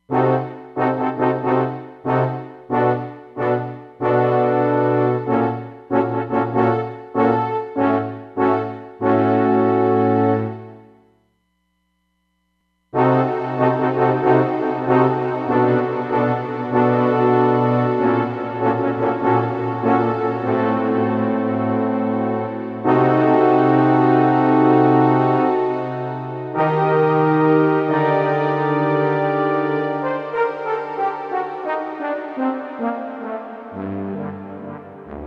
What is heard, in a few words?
Long Hall, Roland DEP-5; Factory Patches